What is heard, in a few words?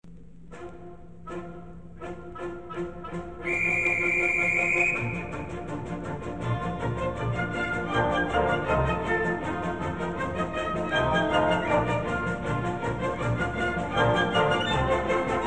Bahn Frei, Symphony Orchestra; 1962; Minneapolis Symphony Orchestra; Mercury Stereo